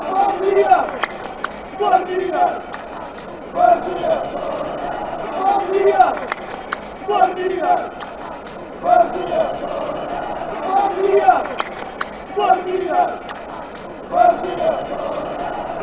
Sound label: metalist chant